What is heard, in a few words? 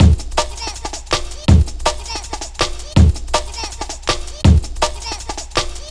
162, Jungle Breaks; Jungle Warfare 1&2